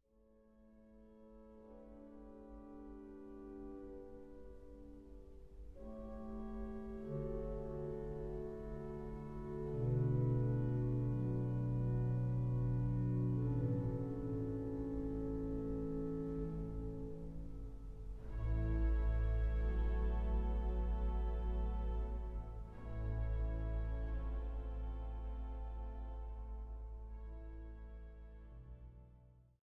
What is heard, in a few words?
Fantasie la majeur